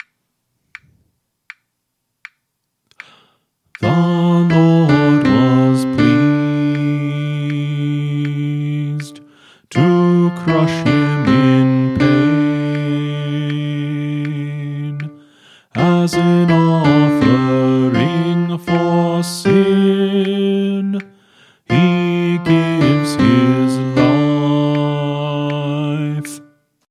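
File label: Song, Choir Practice Tracks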